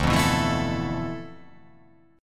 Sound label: D Minor 6th Add 9th D